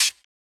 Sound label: OH, HATS; Itchy; RoBKTA Sample Pack Supreme